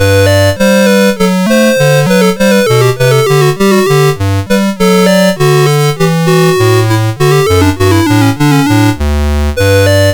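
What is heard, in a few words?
Allegretto Scherzando, MOS 6581R4; Lord UK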